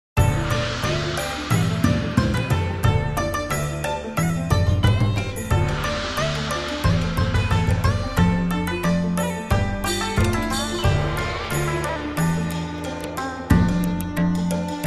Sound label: Chinese Unification (Victory); Civilization IV Warlords gamerip